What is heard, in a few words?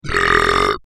brap